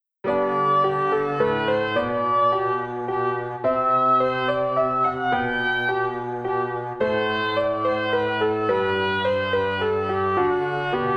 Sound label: Minuet; Bach; Minuet 3